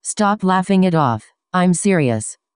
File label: laugh